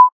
Click; Diamond Diaries Saga; Android, iOS

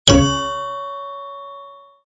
Sea Plant; The Wonder Pets Save the Sea Creatures

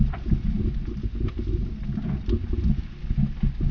Bouill VerySlow Move; Puddle Plus

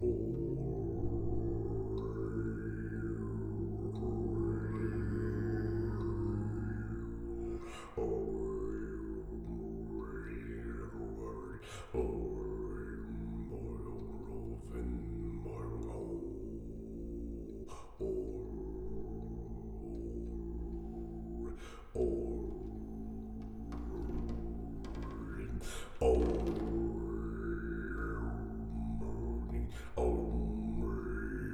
Puzzle; Puzzle Litho

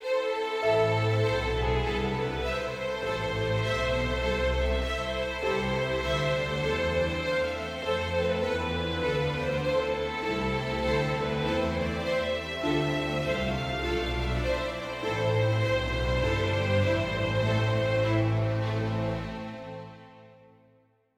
adventure forte

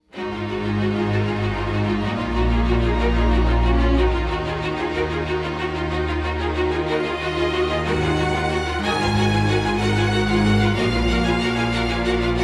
Dramatic Moment

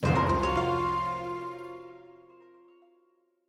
Music Egypt End 108bpm

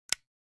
Flashlight Off Sound Effect; FNaF: Final Hours Soundtracks

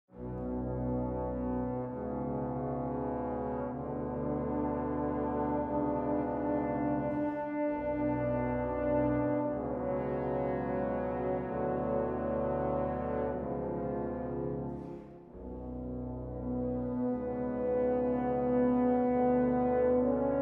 Character Introduction Music; Wyll

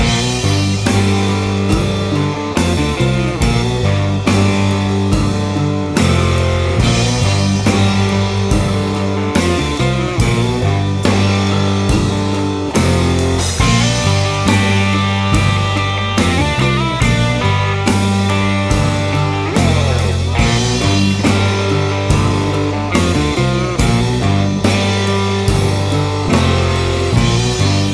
Country Blues Loop; Meow Motors

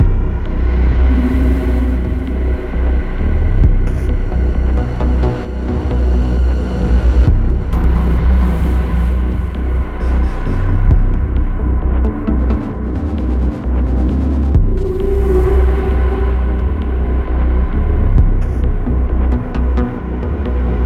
Multiplayer Loading Screen; Modern Combat 5 Blackout

